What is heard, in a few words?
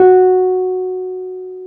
PNO, Wurly